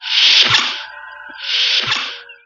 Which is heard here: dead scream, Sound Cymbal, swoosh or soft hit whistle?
swoosh